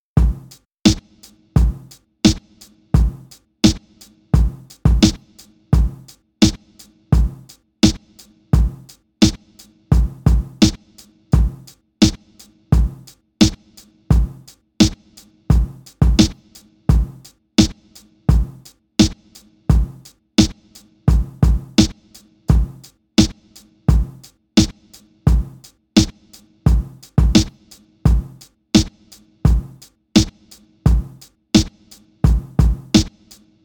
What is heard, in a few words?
Hip Hop Drum Loop